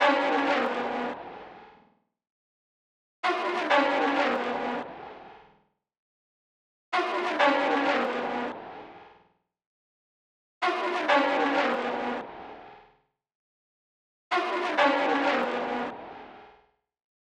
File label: 130 BPM A# Min Weird Synth; Melody; Trap; Loop Stems; Gremlins